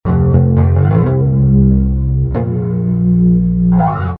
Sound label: Bass Amb F, C, Bb 116